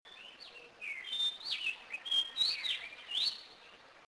icterus portoricensis aguas buenas puerto rico; West Indian

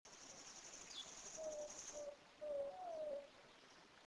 leptotila jamaicensis anchovy jamaica; West Indian

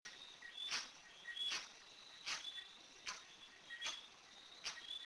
aristelliger praesignis, Jamaica; windsor; West Indian

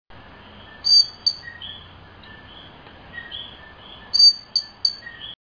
eleutherodactylus cochranae carolina puerto rico; short, piercing whistle, sometimes followed by one or two "ticks"; West Indian

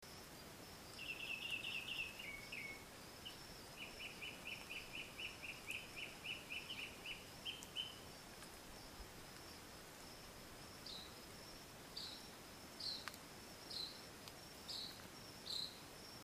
Mimus polyglottos; jaragua national park dominican republic; West Indian

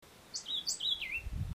Vireo modestus Barbecue Bottom Jamaica; West Indian

